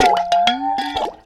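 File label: WASHEE BELL, metallic percussion; Rarefaction; Poke in The Ear With A Sharp Stick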